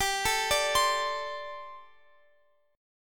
G Suspended 2nd Suspended 4th G, down, Guitar, Open F; Gsus2sus4 arpeggiated